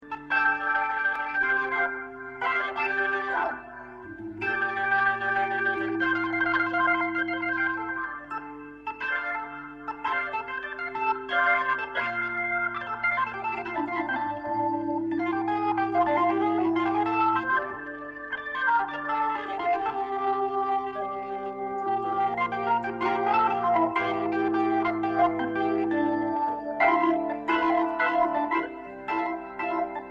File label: Chops, Hammond T-522 Tone-wheel Organ; Typical rock Hammond setting. The distortion comes from the 760 itself.